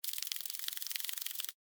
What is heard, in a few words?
Millipede Walk, Animal, Millipede, Walk, Centipede, Movement, Insect, Bug; Millipede Sound Effects; BlastWave